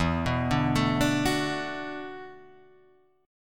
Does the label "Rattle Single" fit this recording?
No